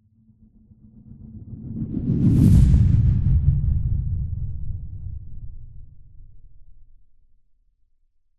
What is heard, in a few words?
science fiction spacecraft fly by fast